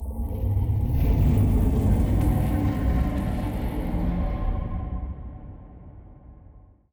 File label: mutated void worms muted; Stellaris; grand archive